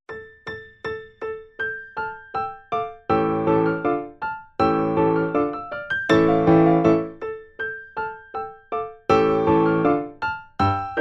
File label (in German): beide, Vierhaendige Uebungsstuecke; Playbacks; Diabelli